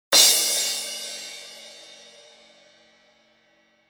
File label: cymbal raw